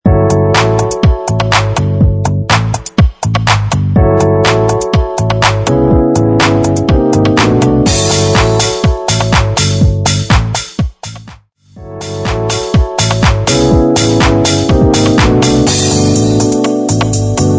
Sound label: 123 BPM 30s House